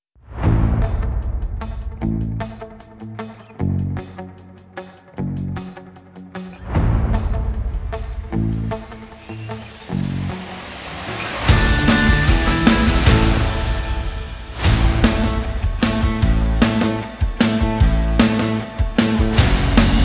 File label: Positive Vibrations